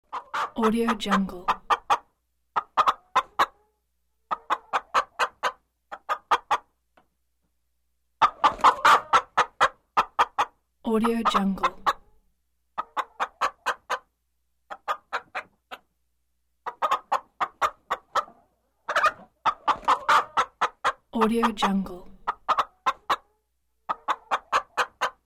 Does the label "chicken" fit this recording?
Yes